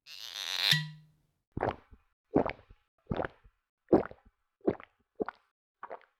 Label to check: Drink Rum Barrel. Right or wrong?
Right